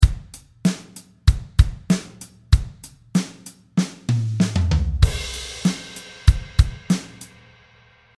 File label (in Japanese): タントンタカトン